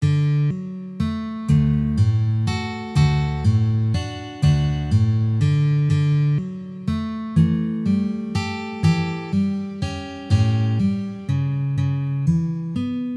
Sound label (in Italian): chitarra; Danubio blu